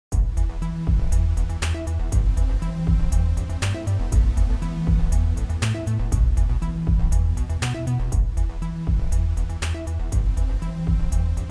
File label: Loop With LFO Filter Resonance; AtomicTransient